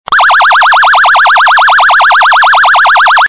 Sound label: Hyper Yelp